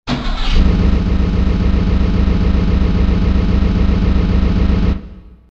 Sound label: engine